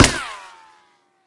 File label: wood, bullet collide